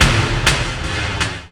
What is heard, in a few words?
FX HIT, Techno Trance; Ueberschall